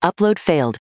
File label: up fail; HA-MIC05R2